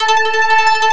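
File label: ambient pad, synthesizer; AKAI S6000 CD-ROM - Volume 1; DIGI PAD FX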